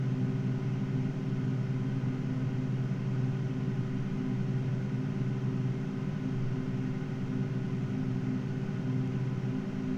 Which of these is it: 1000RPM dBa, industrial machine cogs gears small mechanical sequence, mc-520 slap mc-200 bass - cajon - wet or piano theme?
1000RPM dBa